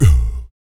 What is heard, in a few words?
VOC PERC, Vocal Planet Jazz FX BASS PERC